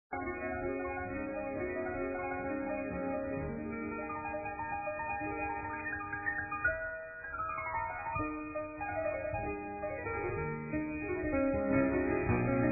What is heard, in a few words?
piano